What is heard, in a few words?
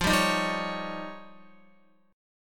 F 7th Suspended 2nd Sharp 5th, Guitar; F7sus2#5 strummed